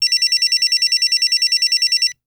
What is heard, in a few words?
Cell Phone Ring; Communications